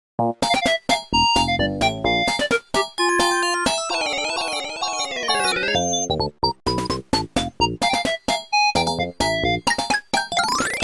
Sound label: Water Minigame; Bubsy 2; Genesis